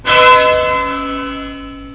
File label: Bell; OldBells